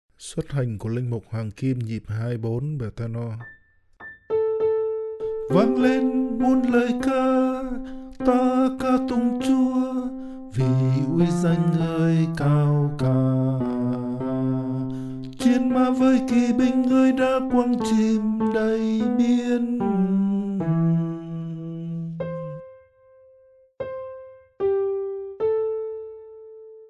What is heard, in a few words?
TENOR